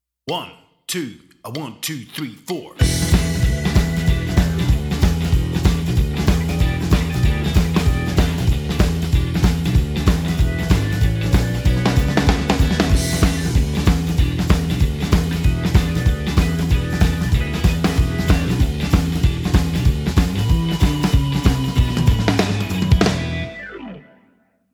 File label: No guitar